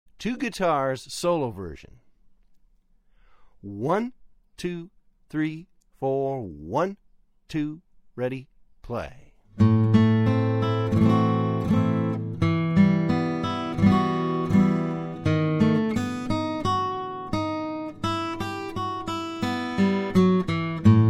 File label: Two guitars interleaved